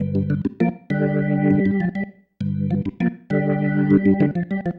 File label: Pattern, Organ